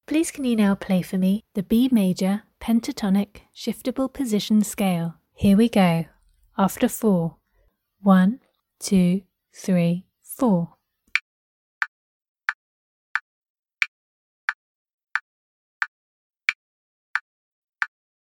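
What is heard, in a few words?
b major pentatonic shift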